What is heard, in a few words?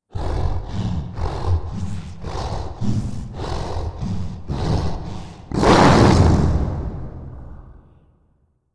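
breathing and growl of werewolf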